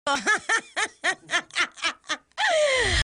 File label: hihi